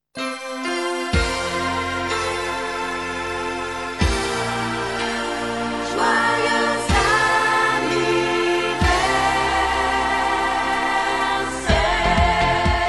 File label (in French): AMBIANCE Joyeux Anniversaire